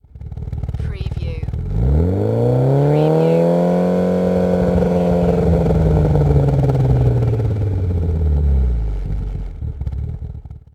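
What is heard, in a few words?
MITSUBISHI EVOLUTION EXHAUST LONG REV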